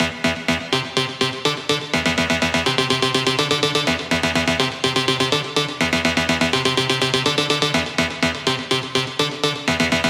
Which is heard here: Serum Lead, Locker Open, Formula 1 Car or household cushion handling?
Serum Lead